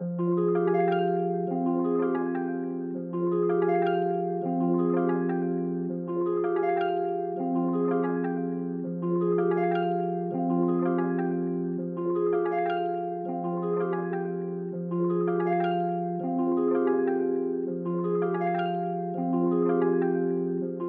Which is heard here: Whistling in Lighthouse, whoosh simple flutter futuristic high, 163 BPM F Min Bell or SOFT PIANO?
163 BPM F Min Bell